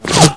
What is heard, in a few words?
whizz, bullets